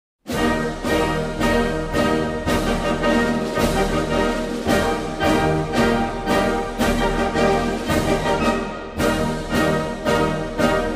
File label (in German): fahnen marsch